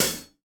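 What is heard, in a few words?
Half Hat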